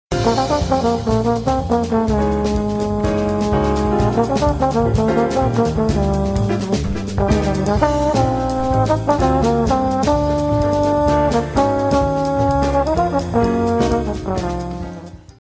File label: Trombone Snippets